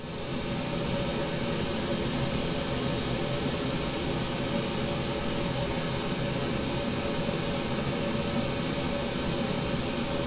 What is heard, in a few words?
Pink Noise; Corso acustica